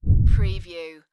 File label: Monster Footstep